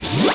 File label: enemy drop; wob2 demo